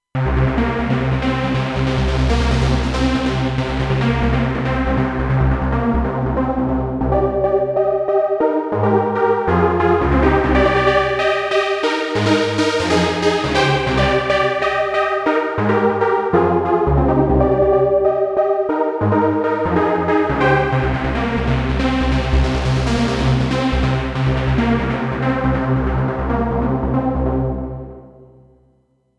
SH-201 vs JP-8000, PAD

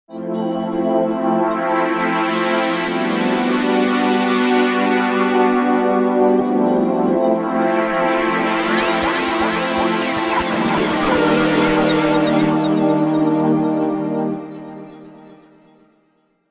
Pad Ocean, V-Synth

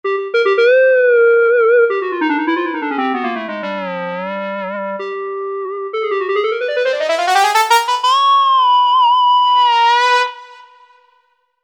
JP8 Square; V-Synth

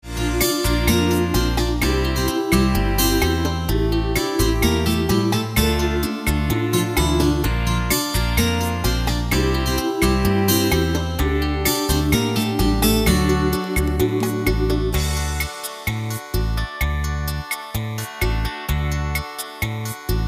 Bachata; LatinAmerica

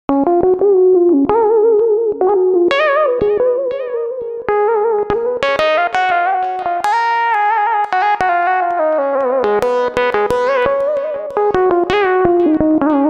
Clicky Lead, v-synth

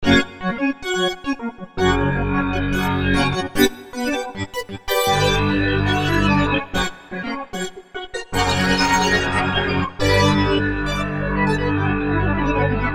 Glass Organ, V-Synth

